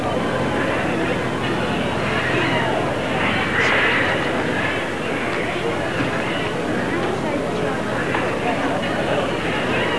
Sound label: zoo crowd; Mr. Wonder's Greatest Toyshop on Earth; OmniMedia